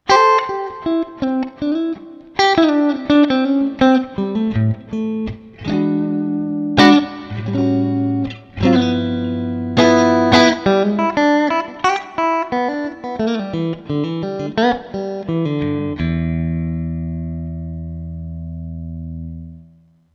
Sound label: strat clean